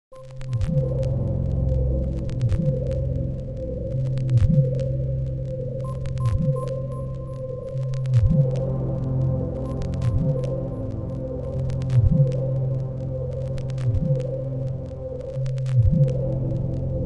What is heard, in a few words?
Ambient Uboat